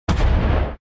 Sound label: Bullet Explode